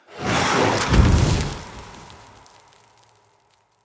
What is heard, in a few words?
Blaze Armour Activate Cast; Powers; Fenn